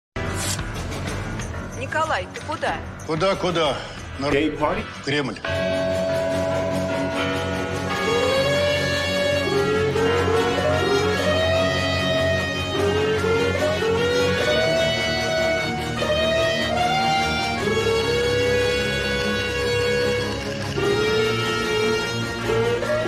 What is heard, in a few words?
Gachi Remix